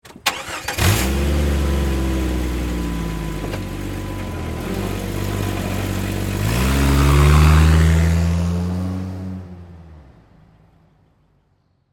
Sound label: Jeep Cherokee, Automobile, Laredo, Exterior, Start, Drive, Away; Transportation; 1998; Car; BlastWave